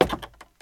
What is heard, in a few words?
wood step; Golden Crayon Sounds